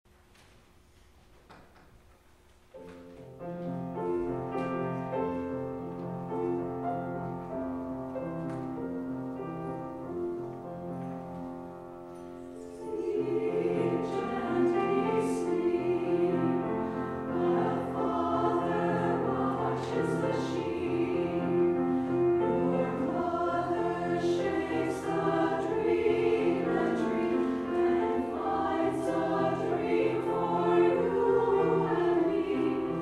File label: Sleep Gently Sleep Women